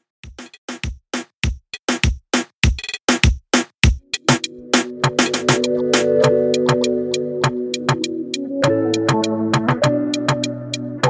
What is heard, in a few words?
Afrobeat Reggaeton 100Bpm